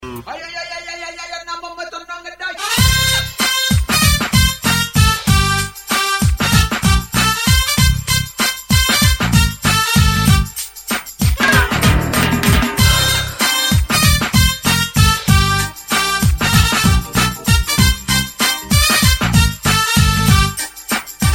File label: Bass